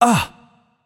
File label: nv surprised; cernunnos